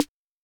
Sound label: Snare, Acetone Rhythm-King